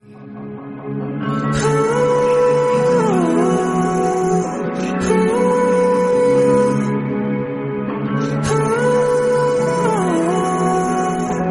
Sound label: Korean song